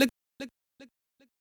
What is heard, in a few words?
voice